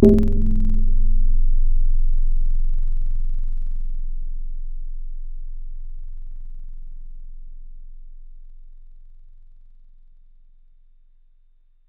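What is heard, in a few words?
mallet Marimba lo, Wavetables; Synthetic's Serum Collection; Standalone-Music SYMPHONY Wavetables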